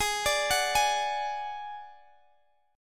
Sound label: Gb Suspended 2nd Sharp 5th Ab